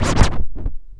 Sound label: ST SCRTCH, SCRATCH; AKAI S-Series CD-ROM Sound Library VOL-4